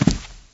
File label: Carpet Medium, shagov